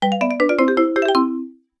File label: Fanfare Rupee; Get a large rupee (Recorded); Zelda